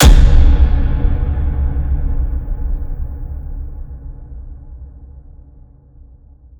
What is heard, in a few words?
clock